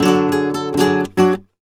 Remates De Pulgar, WAV Guitar, Flamenco; ProSamples vol.46 - Flamenco

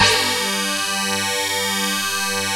FM SYNTH; OMI - Universe of Sounds

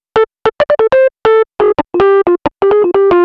Rhodes Solo, Cinematic Funk; Cinematic Funk Urban Tone Poems

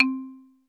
XYLOPHONE, TONAL PERCS; Crypton - DrumBase5000

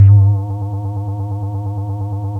SP SYNTH; SP1200; E-MU Producer Series Vol. 8 – Platinum Phatt; PlatinumPhattCD1

